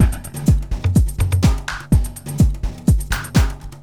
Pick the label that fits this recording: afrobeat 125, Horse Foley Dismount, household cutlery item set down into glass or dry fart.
afrobeat 125